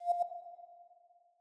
Move G6 Mf; Mixolumia

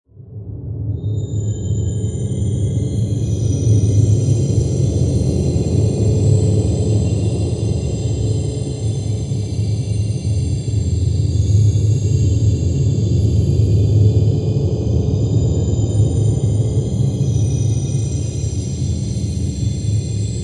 Rhythm Temple Sacred Ambience; Mountain SFX

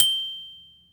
Bead Bell; Levels Hub Orleans; Bead Raya Bell

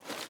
ice jump

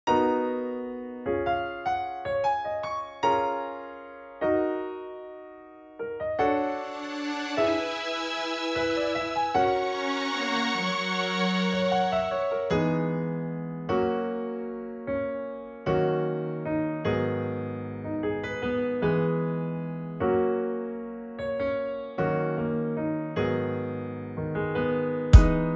karaoke; Hakurei Jinja Reitaisai 9

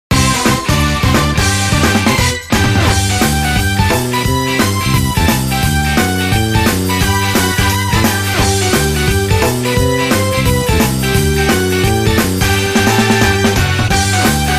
Music Success event

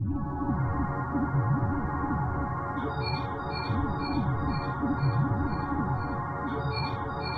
Ambient

